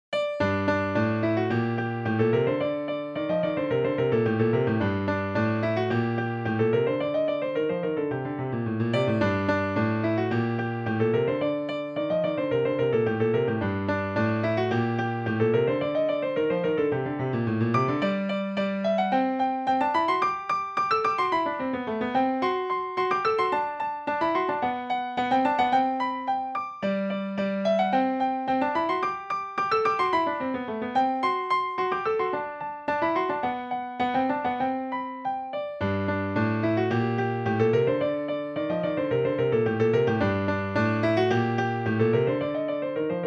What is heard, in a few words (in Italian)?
Per Piano Armonizzato; Anglaise